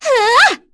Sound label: Vox Attack; Yuria; kingsraid